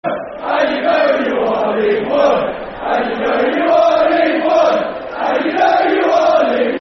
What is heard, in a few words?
Football Chant; League One And You Know You Are; Swindon Town Stfc